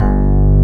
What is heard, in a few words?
DPF G0, electric piano; ELECTRIC PF#1